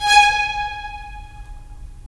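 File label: strings violins, left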